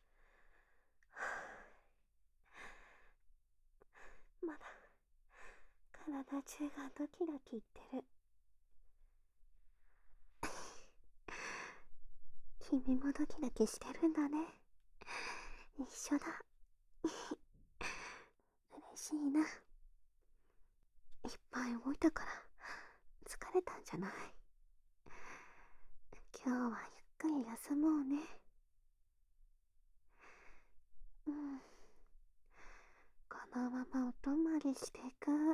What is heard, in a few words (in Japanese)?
おやすみなさい(寝息:左), ASMR